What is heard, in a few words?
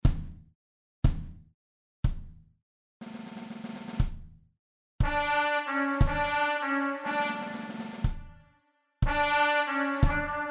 betterie trompette2